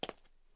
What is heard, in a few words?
footstep; gmtk24